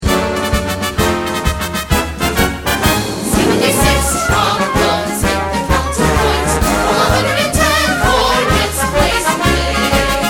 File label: Trombones